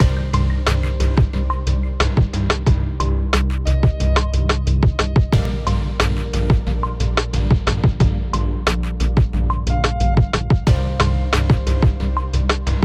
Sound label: Loops; Abstraction; Patreon Goal Reward Loops